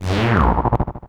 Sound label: shadow pendant; deltarune yellow translation